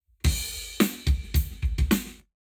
Lab Wet Drums 0deg

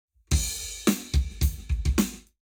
Lab Wet, Drums 0deg; eMagLS

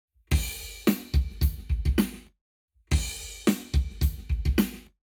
Lab Wet Drums 0to360deg; eMagLS

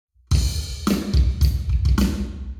Hall Drums 90deg; eMagLS

